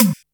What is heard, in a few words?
Snare, Boss DR-660, Drum Machines; 90 s Sample CDs; 300 Drum Machines